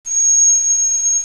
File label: trumpet